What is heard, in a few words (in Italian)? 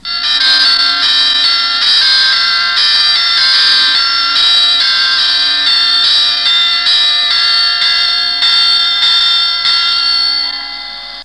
campane